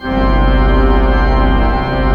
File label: PED, Pipe Organ; E-MU Producer Series Vol. 2 – More Studio Essentials; Keyboards